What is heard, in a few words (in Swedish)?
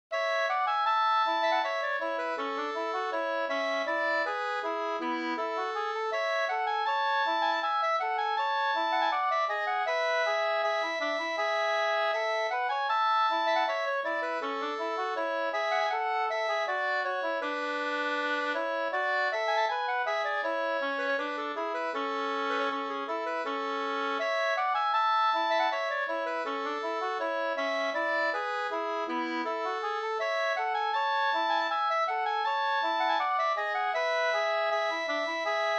brudmarsch Hogtidsmarsch; Ljusnarsberg